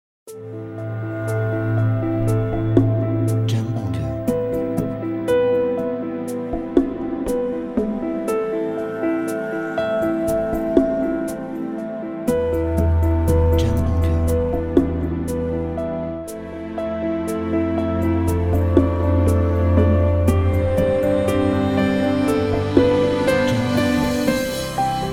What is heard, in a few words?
Travel Landscapes